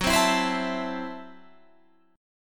F sharp Minor 7th Flat 5th F sharp Guitar Half Step Down